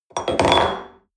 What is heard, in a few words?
espresso upset table medium, restaurant cafe